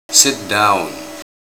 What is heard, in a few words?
sit down